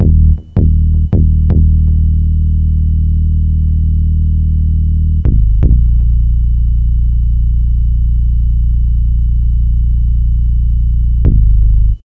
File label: Bass; Blockland